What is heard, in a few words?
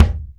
Kick, Alesis DM5